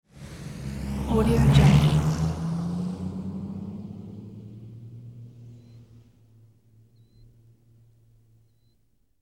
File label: twin prop airplane landing; industrial sounds; فرود هواپیمای دو ملخه